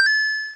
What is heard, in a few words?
Coin Pickup